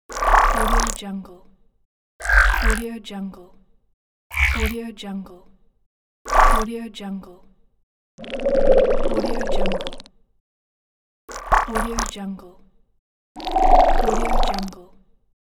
powerful futuristic transition

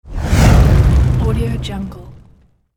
fireball

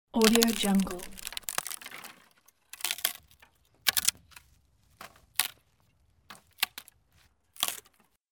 brushwood breaking; fxprosound

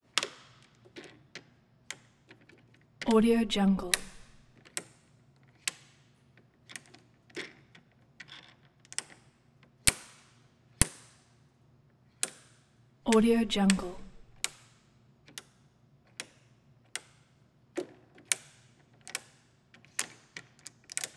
foosball point marker slide roll and hit wooden

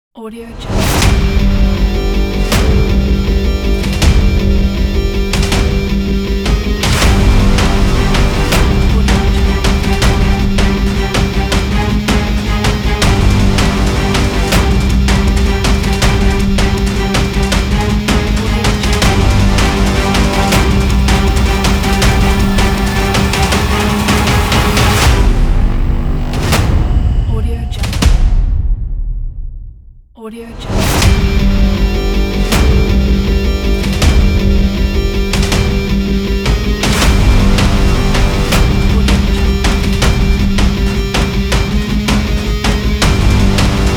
action trailer dynamic intro

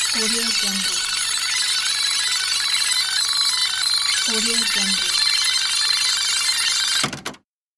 rewinding cassette in deck during playback

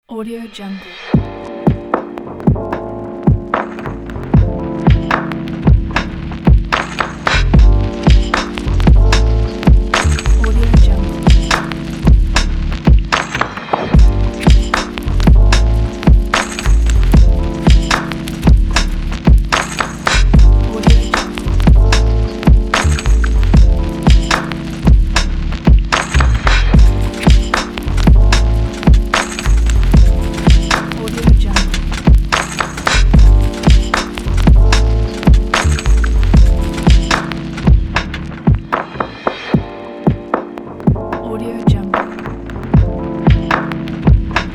jazz chill hip hop; orangetreesounds

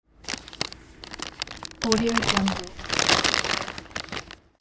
rustle

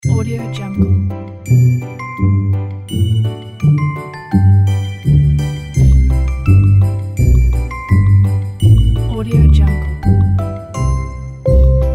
happy new years holidays

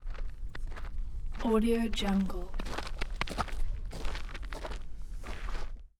walking on snow

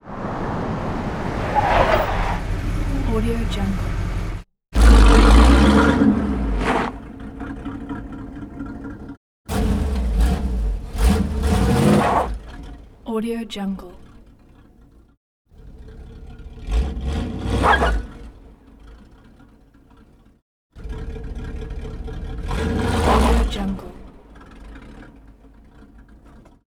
skids tire spins various versions

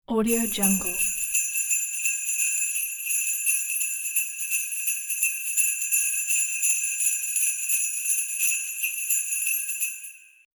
sleigh bells

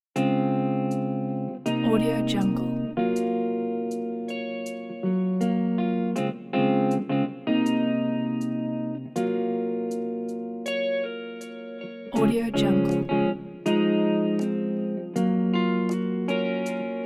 latin romantic

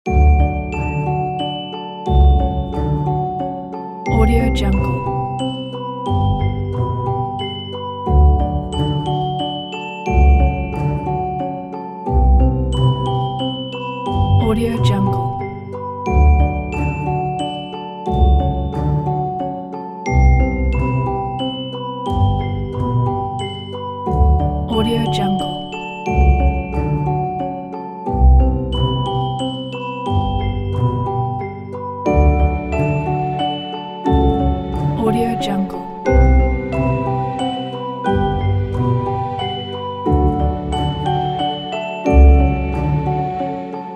lullaby for kids

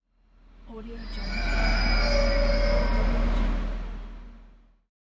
squeaky train brakes

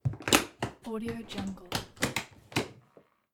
suitcase latch open

